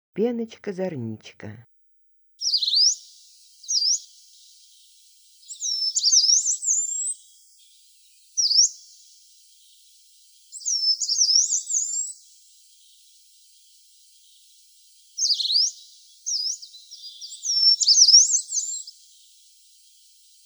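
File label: yellow-browed warbler voice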